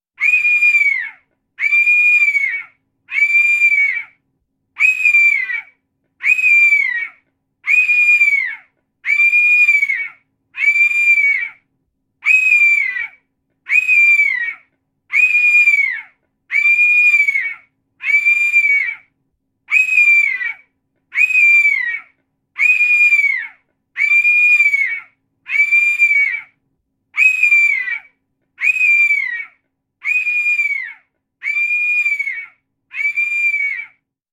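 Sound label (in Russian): zvuk krika hishnoy ptizy dlya otpugivaniya voron, cry of a bird of prey for scaring crows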